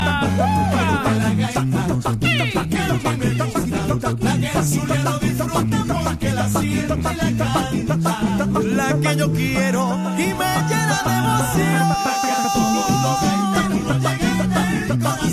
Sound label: vocal song gaita; Latin